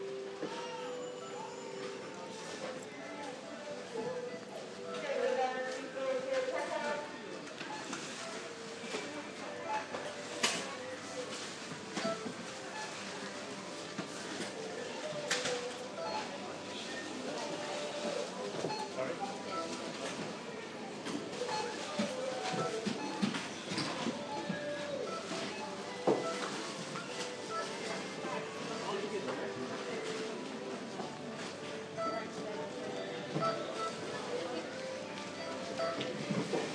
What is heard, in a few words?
shopping centre soundscape